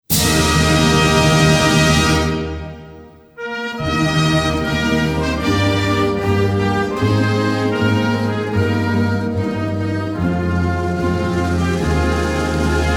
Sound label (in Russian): gimn instrumental